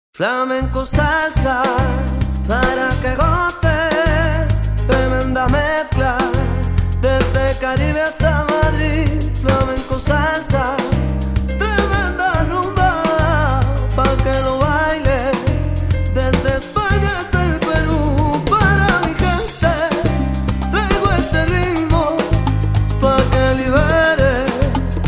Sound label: Flamenco salsa